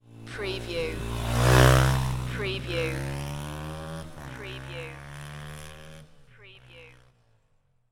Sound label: Motorcycle Dirt Bike Drive By Center